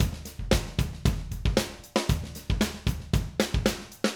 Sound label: Acoustic Live Drum